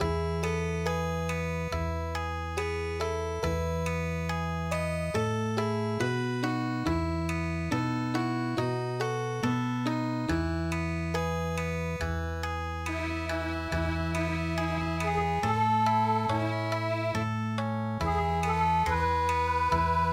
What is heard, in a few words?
Okinawa, midi; ittah anmah kaiga